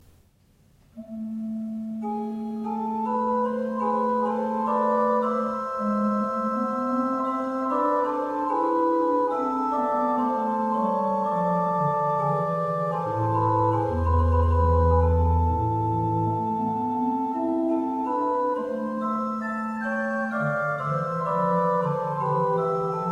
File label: Organ Trio du ton 3; ViernesSanto